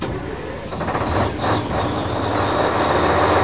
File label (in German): Kranwagen